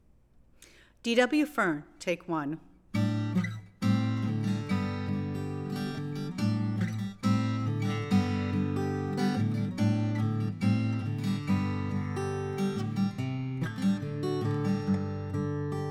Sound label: Aco Gtr; mic pre shootout